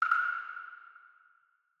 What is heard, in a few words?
nudge; rise of maya